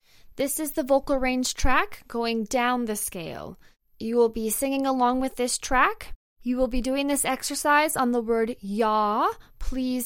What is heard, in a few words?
vocal range down